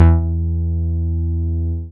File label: BAS MINI, Mini-Moog